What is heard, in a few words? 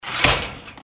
door